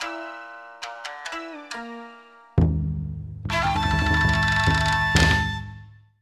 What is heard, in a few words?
Victorious Battle; Samurai Shodown; Samurai Spirits 3; Blades of Blood; PlayStation